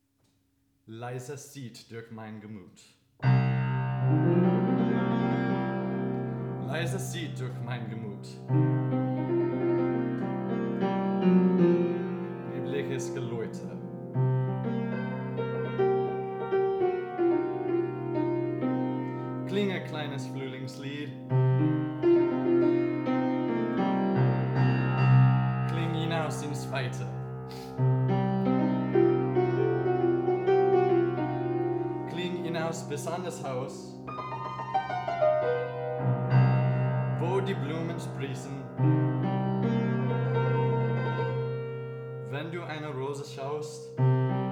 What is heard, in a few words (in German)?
mit Klavier; Heinrich Heine; Leise zieht durch mein Gemüt